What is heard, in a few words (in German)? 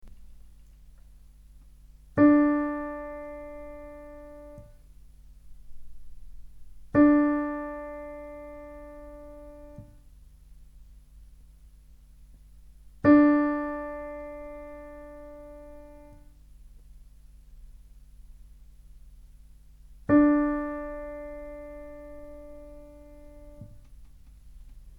Stimmton D 440; Play-Along für Instrumentalist innen